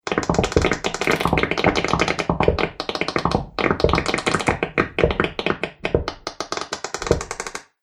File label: Farty Slime Bubbles Slow; sound design; slimy; Slimy Sound Effects